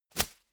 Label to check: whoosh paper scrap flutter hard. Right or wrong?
Right